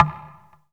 Knock

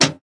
Perc

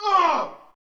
"Uhaa", vox

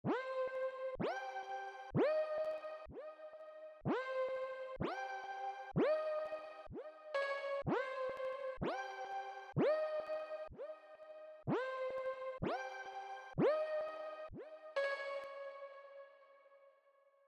126 Rev Piano

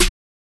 Open Snares

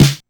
Snare, LinnDrum

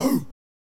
Chants, Vox

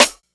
Snares

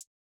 Hi-Hat

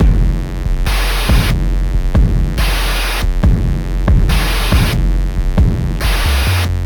Loop

